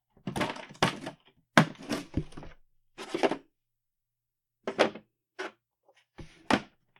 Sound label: toolbox shuffling; sonniss gdc